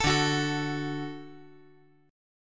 D Major D down

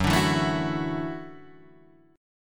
F sharp Minor 7th Sharp 5th; F#m7#5 Chord {2 5 2 2 5 2} strummed

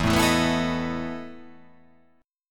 E Major 7th Suspended 4th E

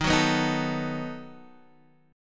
B 7th Eb

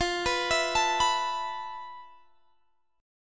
F Major 7th Flat 5th Guitar Open G, chord; down; chord shape

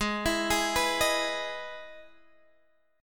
E Major 7th G sharp, chord

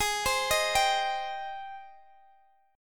Ab Major 7th, Guitar, Drop D; arpeggiated; x,x,18,17,16,15

